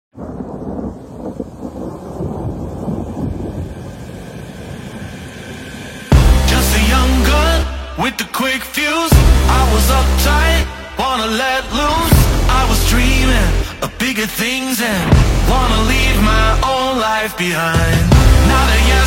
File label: Thunder hardstyle